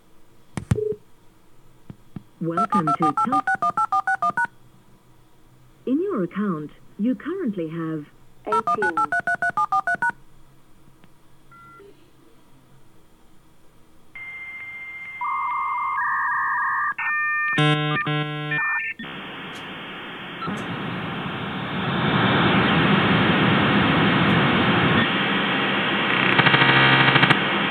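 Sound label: Modem Connection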